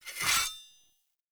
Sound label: Short Sword Equip; Weapons; Enemy; Swords; Sinecure Short Sword